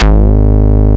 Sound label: Ultimate Bass G# Root G#; Vengeance Samples